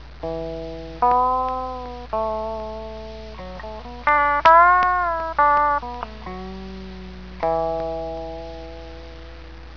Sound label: blues